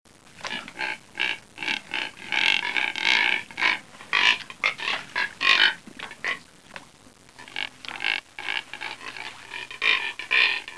Neotropic Cormorant

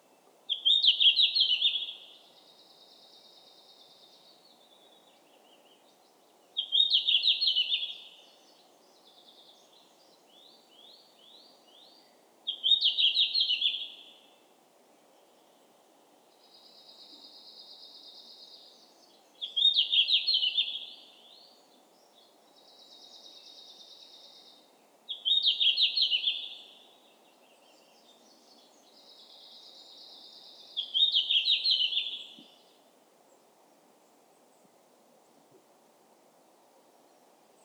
Red flanked Bluetail

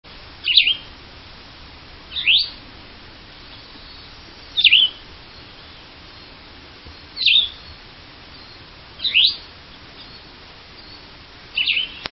Cassins Vireo

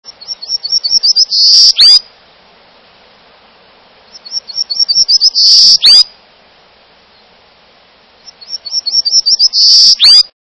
Golden cheeked Warbler

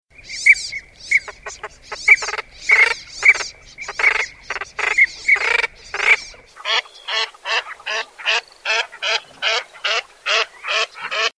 Northern Pintail, songs

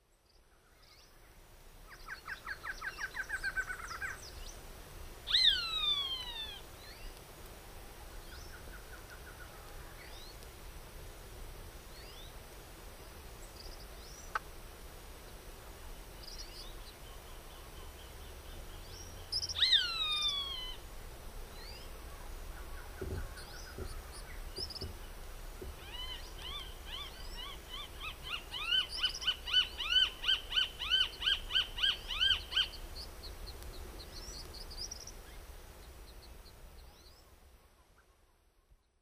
Roadside Hawk, songs

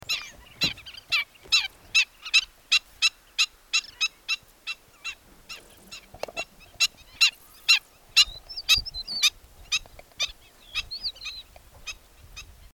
Black winged Stilt

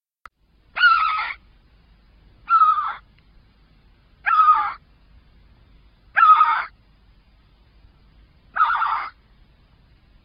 Great Skua

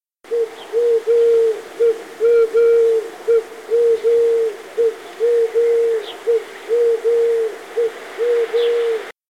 Eurasian Collared Dove, songs

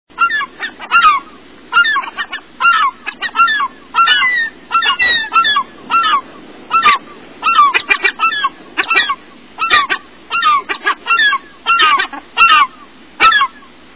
Glaucous Gull, songs

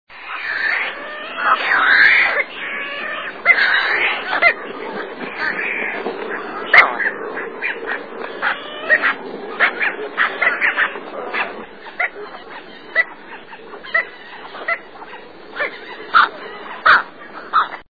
Brown Pelican

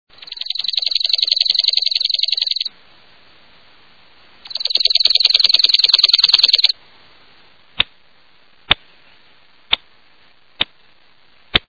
Chipping Sparrow

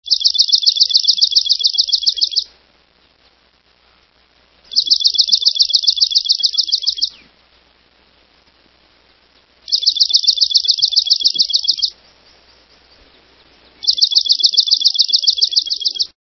Swamp Sparrow

